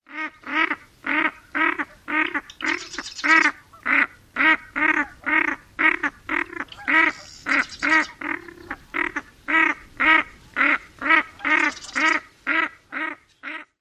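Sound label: female harsh quacks, Redhead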